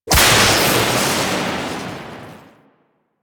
Void Tear Tendril Attach; Warframe

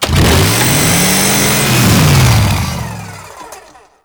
Saw Melee Swing Heavy; Weapons; Warframe; Grn Ghoul Saw Player

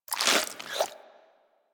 Armadillo Tongue Move; Warframe; Hunting Critters; Foley

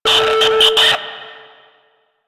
Miltary Backpack Shutdown; Objects; DE; Warframe; Storage Container

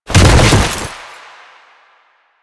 Bodyfall Default; Warframe; Lasrian; Lasrian Soldiers; Foley; Body Falls

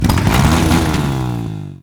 Infested Hammer Accent Rev, Weapons

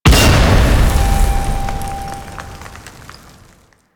Heavy Slam Ability Slam; Warframe; Duviri; Dax

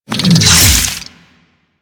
Melee Swing; Enemies; Infested Big Ashen; Warframe

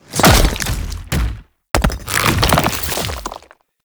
Biped Entry, Enemies; Warframe; Man In The Wall

